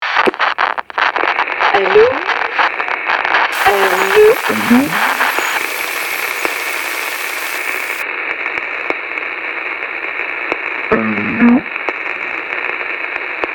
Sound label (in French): telephone casse